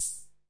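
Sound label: Korg MiniPops, hi hat, drum machines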